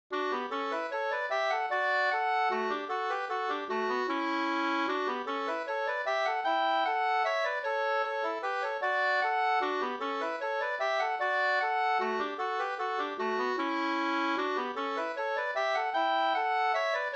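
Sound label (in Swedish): vals, two-part; Gards Jonasvalsen fr Sarna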